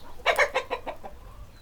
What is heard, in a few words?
Chicken; anemoi